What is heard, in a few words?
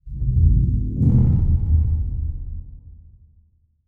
Transition Whoosh Drone; Production Element